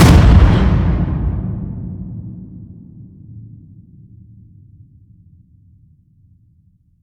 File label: HIT Metal Crash, Cinematic Hits Designed